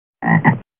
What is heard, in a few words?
Frog